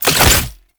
Fuel Projectile Drop; Warframe; Jade Shadows; Ascension

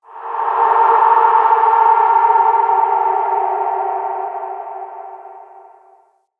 Crypt Spirit Cry; Ambience; Champion Crypt

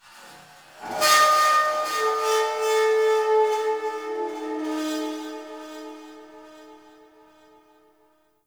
Metal Scrap Texture Med, Corpus Ship ambience; Textures

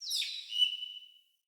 Bird Song; Josalfar Bird

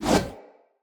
One Handed Axe Whoosh

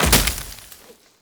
Tenno Land Heavy Veg, footsteps; Warframe

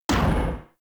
Pulse Laser Mech; Warframe; Railjack; RailJack Weapons

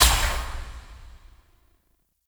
Dash End; Infested; Zealoid Prelate

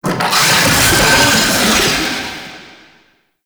Summon Vocal Verb; Warframe; Uriel; Catenach

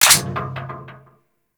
Turret Mech, Grineer Crew Ship Turrets; Warframe; RailJack

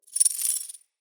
companion collar jingle; Pets; Zanuka

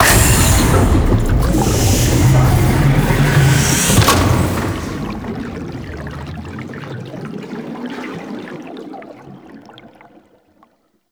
Ocean Injection Machine Toxin Activate; Ambience; Warframe; Grineer Ocean; Ocean Sabotage Injection

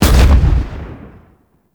Army Proj Explosion; Warframe; Warframes; Hoplite

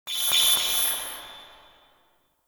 Dojo Tech Beep; Warframe; Mod Quest; Teshin's Hideout

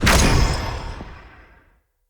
Staff Caster Cast Long Shot; Weapons; Player; Staves; Wazzard Staff Caster

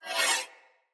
Sword Whoosh Accent; Fey Sword

